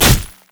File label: spider basic attack; Elise